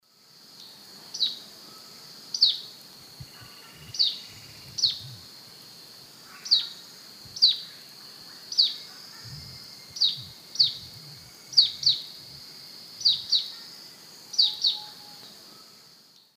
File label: Microrhopias quixensis, call male, S. America Eastern Lowlands